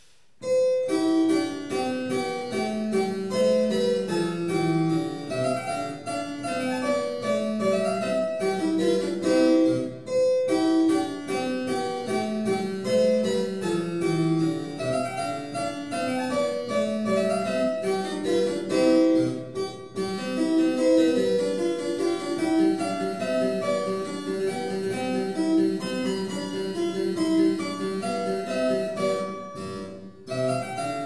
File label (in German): Cembalo; mozart